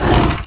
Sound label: open door boss; Nokia; 5800 XpressMusic; Bounce Touch